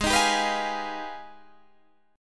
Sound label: Ab Minor Major 13th down Guitar Standard; strummed; JGuitar